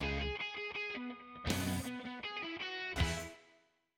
win short; Toy Story 3 Memory Match

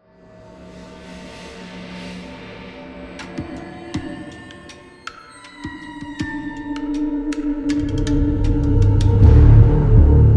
Hunt - Ambient

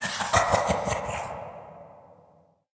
Spirit Mausoleum Possession Hit; Fallen Ones

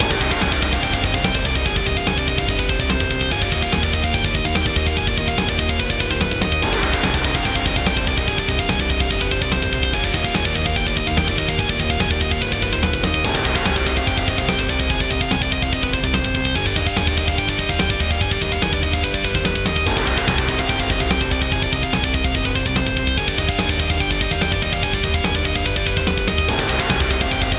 Samurai Music; Super Samurai Rampage android ios mobile windows gamerip 2017; Level 6

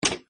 Player Ladder; Acid Rising

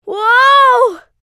Monkeybar Fall

